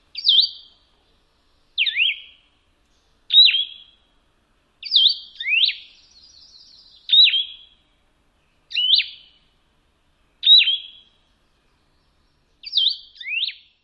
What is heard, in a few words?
song